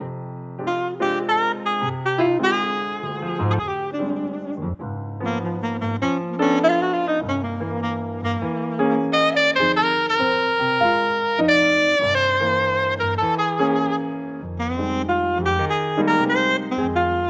Музыка для чтения

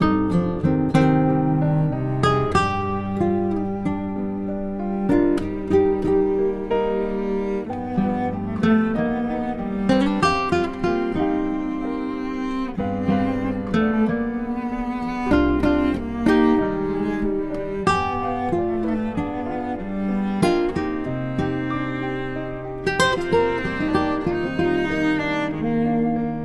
Фортепианная Музыка роскошный

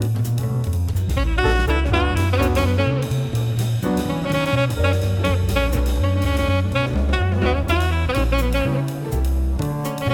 Музыка для чтения Мечты

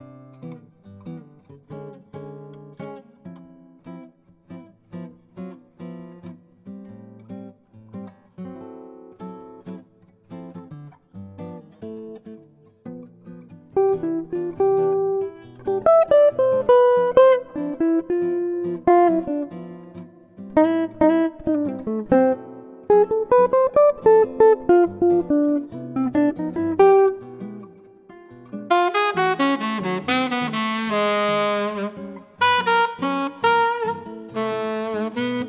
Музыка для младенцев

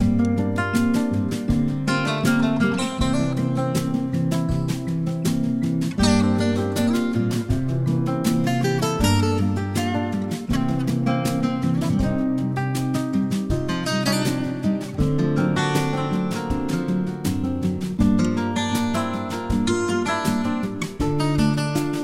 Кафе Музыка Атмосфера

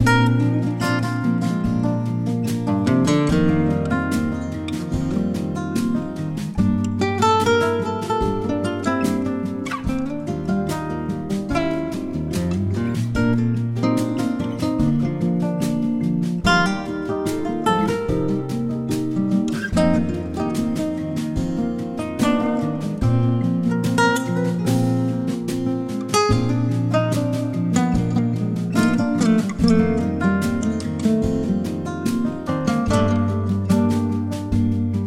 Музыка для чтения люкс Настроения

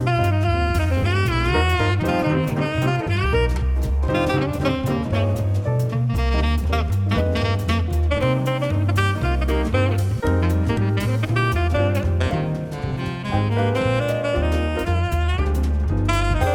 Музыка для чтения люкс

